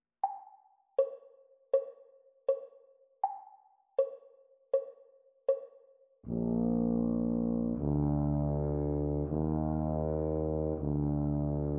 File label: Bb Tuba Duet exam speed; Grade 1